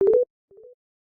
Call Connect; 三星note10手机系统铃声

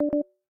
Low Battery; 三星note10手机系统铃声

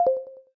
S Beam end; 三星note10手机系统铃声

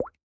Dialer new; 三星note10手机系统铃声

